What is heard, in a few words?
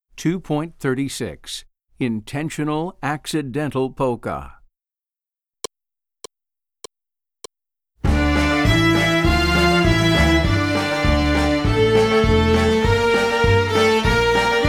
Polka